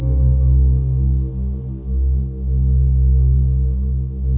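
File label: soft voices, Soft Voice Stack; Optical Media International; Sonic Images Library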